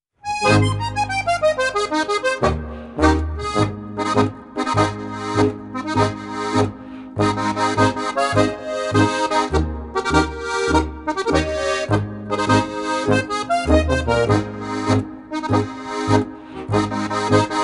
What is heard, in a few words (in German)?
Harmonika